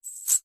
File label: industrial chain small catch hand